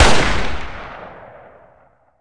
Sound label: hunting rifle